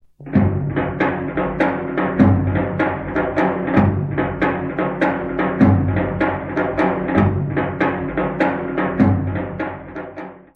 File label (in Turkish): tokmak, halk calgilari